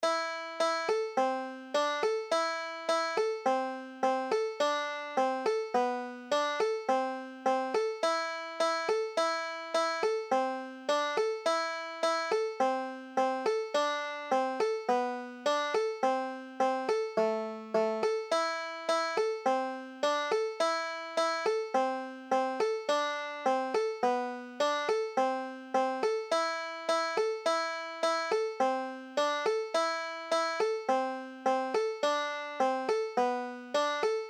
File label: russian folk song, banjo